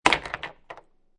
Billiard Pool Snooker Ball Comes In Hard And Falls Into Pocket